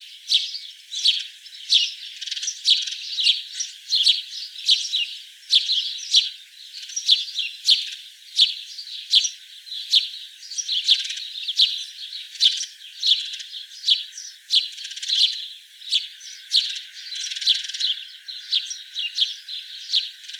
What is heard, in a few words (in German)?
Vogelstimme Haussperling Passer domesticus call; Marco Dragonetti